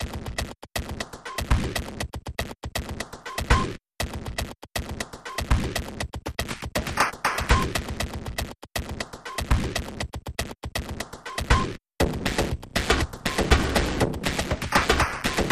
Machine Spectre Glitch Percussion

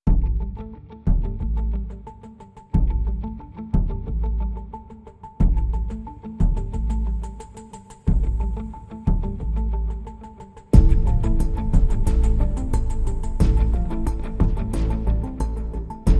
No Strings

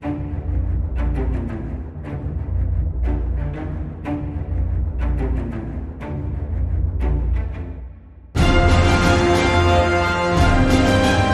No Choir

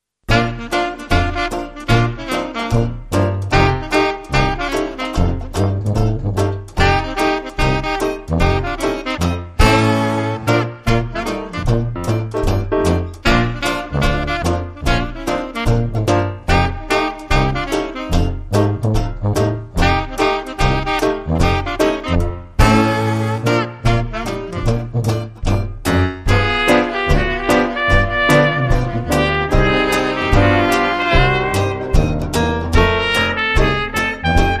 West Coast Swing

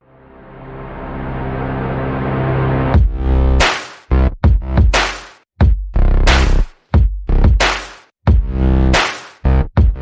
Pizz

